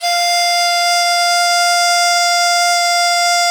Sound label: DIZI F4; E-MU Producer Series Vol. 7 – Old World Instruments